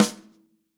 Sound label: Yamaha Holz L hand